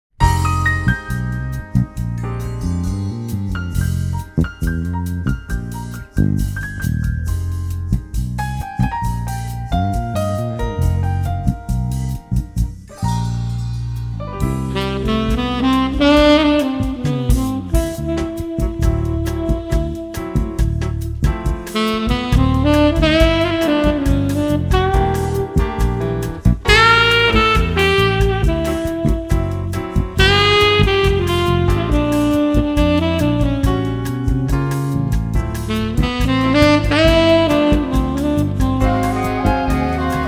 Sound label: Spring Wind